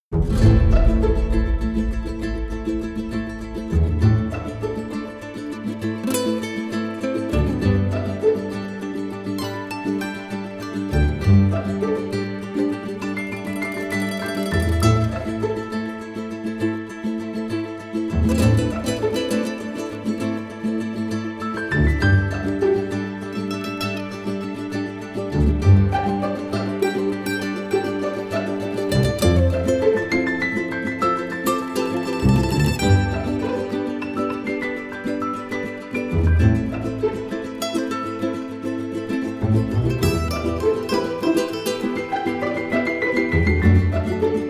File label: strings